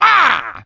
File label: hurt sound; palpatine; lego star wars